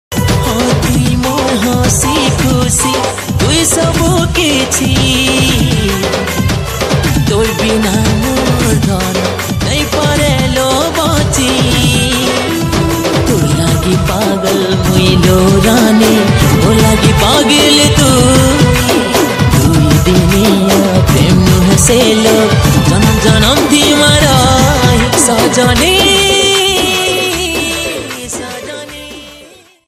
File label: Sambalpuri Song